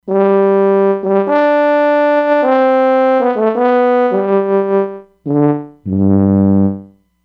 French Horn

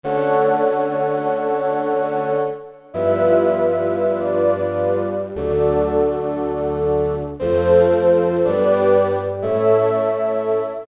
BRASS MIX, Korg DSS-1

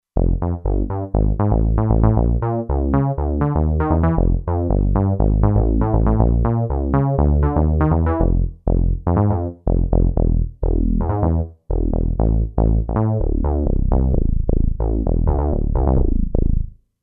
Moogy Bass, Kurzweil K2500X; Factory Programs

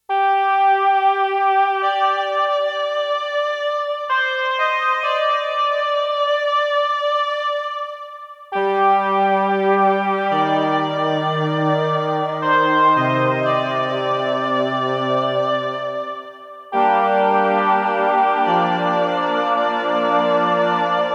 WOODY, Roland JX-1; Factory presets

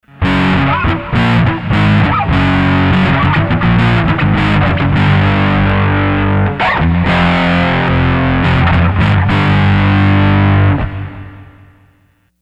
Valve Grung DI; Sony HR-GP5

